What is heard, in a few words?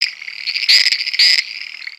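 Pets Insect Large Angry, creatures; Lego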